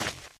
gravel, footsteps, walk; player; source engine; left 4 dead 2; boomer

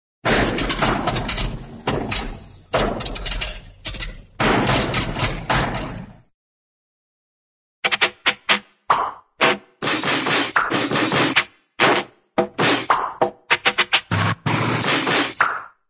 auto, backfire old auto backfiring; cartoon

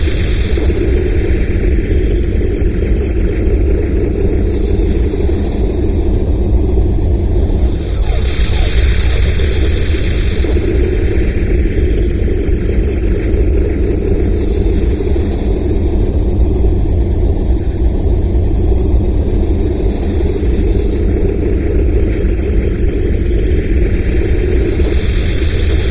citadel critical loop; ambient; city; source engine; episodic

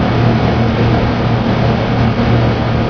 industrial, ambience; source engine; hl1; video games

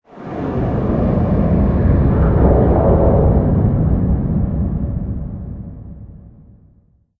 spacebase rumble; ambient; Source Engine; tf

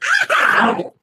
ballbot pain md

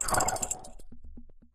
editor turret collapse; Source Engine; Portal 2

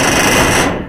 metal solid strain; Source Engine; video game; physics

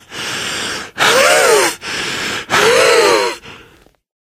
exertion major, biker; source engine; left 4 dead 2

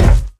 physics cube

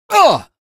pain, barney; hl2; Source engine

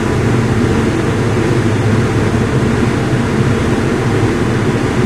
boiler smooth, ambient lair; video game; Source Engine; tf

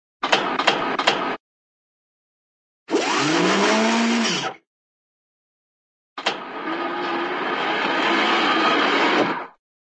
robot, motor mechanical joints; cartoon

